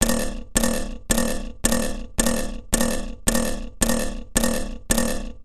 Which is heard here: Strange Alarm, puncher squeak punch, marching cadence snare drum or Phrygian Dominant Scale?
Strange Alarm